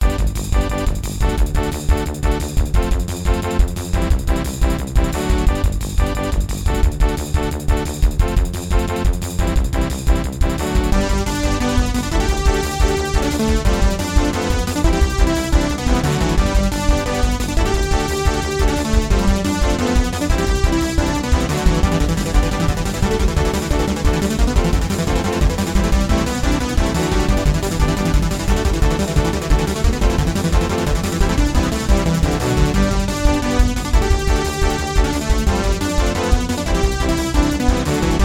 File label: fanfare woods